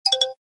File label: Low Battery; Coolpad